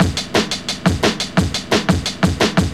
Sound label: Searchin Break; Jungle; Breaks; Blu Mar Ten - Jungle Jungle - 1989 to 1999 Samplepack